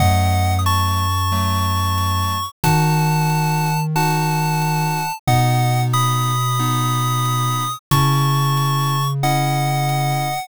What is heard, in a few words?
bgm mellow